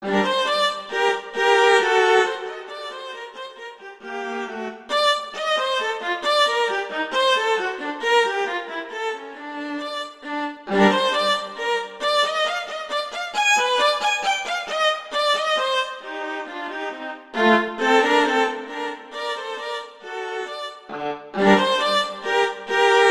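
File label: Allegretto, violin; Reger; Suites; Suite No 2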